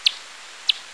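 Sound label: greater mouse, bats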